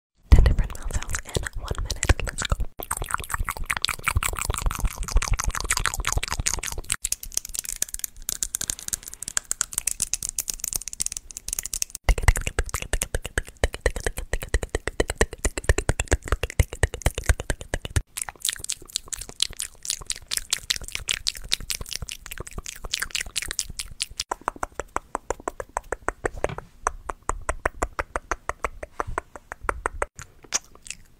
ASMR Mouth

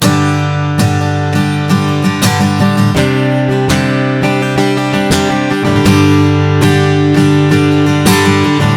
Yamaha guitar

